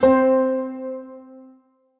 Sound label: Krubera Piano, Broken Keys; Multisampled; Instruments; Ableton; Factory Packs; Mood Reel